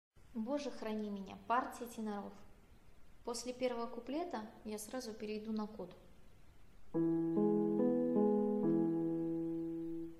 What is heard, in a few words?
tenor